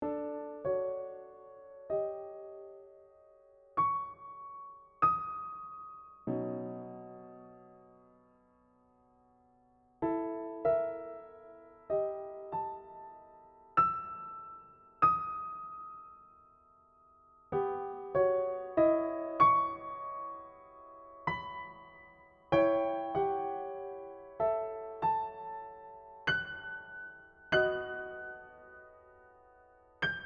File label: The Caged Bird Sings piano; indigo workshop